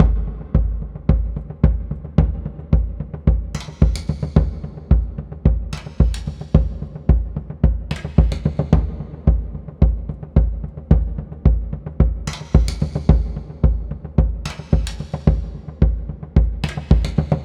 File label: Drums Halling; Unravel gamerip 2016